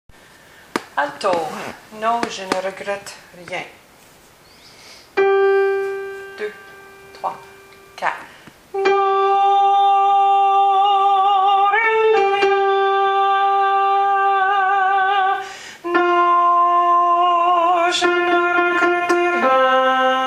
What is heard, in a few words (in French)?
altos, chorale